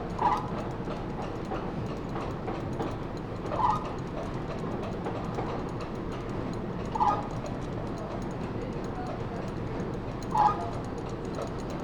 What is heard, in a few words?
Basement machinery atmo, mill